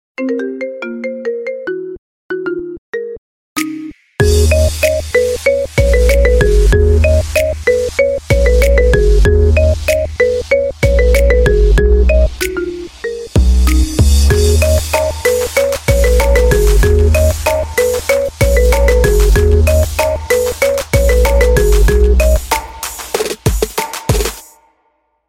Marimba Solo

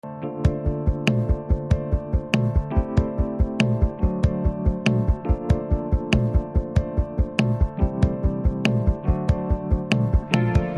BGM Telugu Ringtone; Jaanu

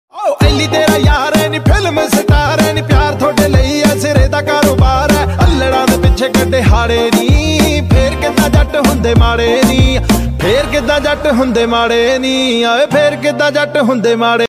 DJ Punjabi Ringtone

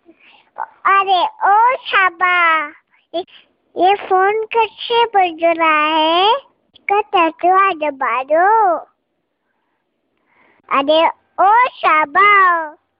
Hindi Ringtone

